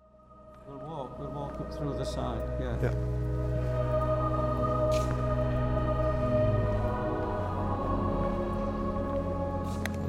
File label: RIPPON CATHEDRAL EVEN SONG; HARROGATE